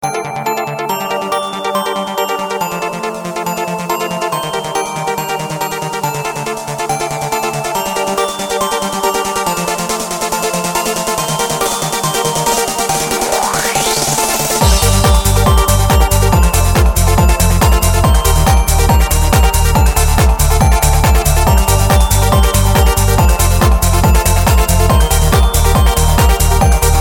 Techno Bellz

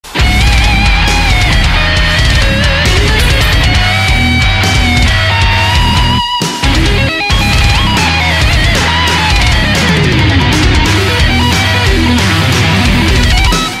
Solo

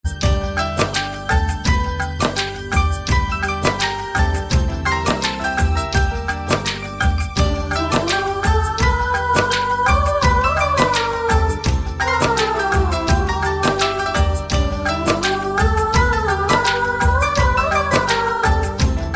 Great Piano Tune; meloboom

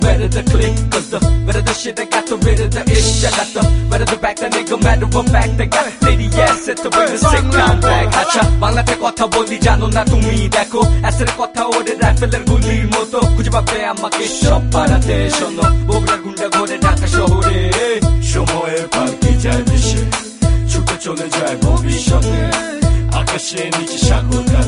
Bangla Rap

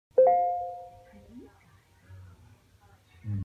Gmail Notification

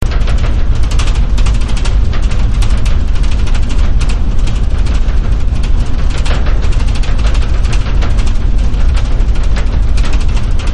Moving bullet Train

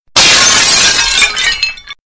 Broken Glass

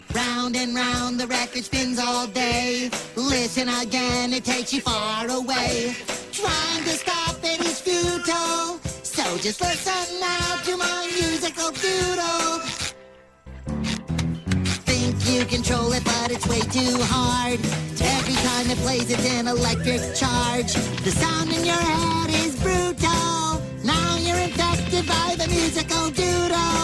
Musical Doodle

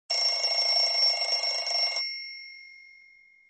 Clock Alert

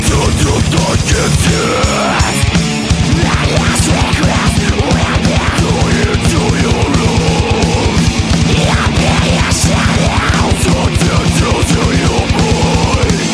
Death Metal

